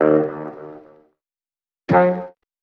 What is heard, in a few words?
sax two note split; Loops; Phonky